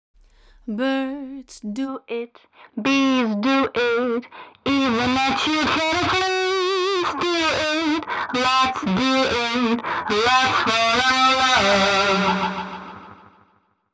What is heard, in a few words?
Amp Chorus Delay